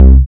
kick boom click, memphis dark

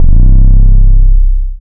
808 c slide

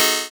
open hat stack, analog

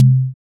tom low, afro drill percussion

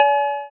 cowbell, drift phonk

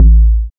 log a, amapiano log drums

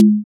conga low; dembow latino

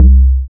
log c, amapiano log drums

